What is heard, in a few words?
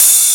Hats Electro Open